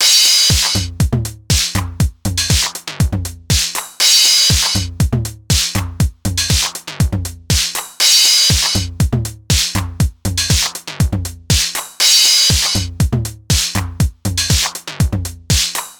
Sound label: TR 909 FX; Audio Realism ADM1x